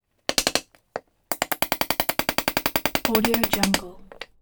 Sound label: rapid wood raps; prosoundfx